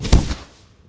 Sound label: Play Fail Item; Pangya; Fantasy Golf